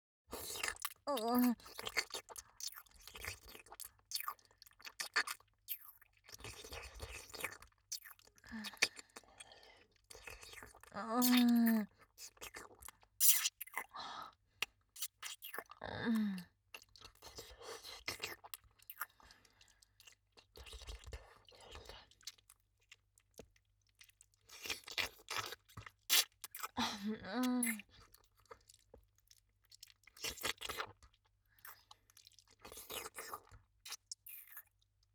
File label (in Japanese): キス音; 痴女系お姉さん; オチンポおねだり～即ヌキ特化チン媚びバイノーラル～